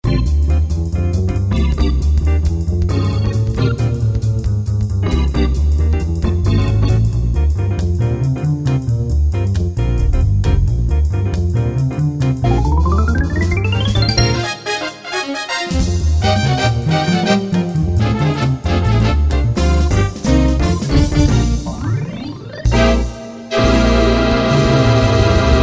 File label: Style Jazz; wersi